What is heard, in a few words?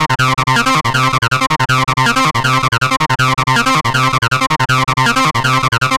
Acid Loops, 160; Dance Synths; Hardcore Loops